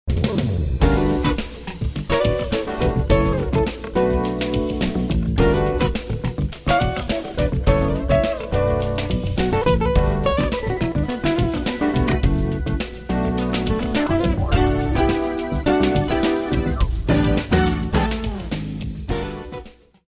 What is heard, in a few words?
Smooth Jazz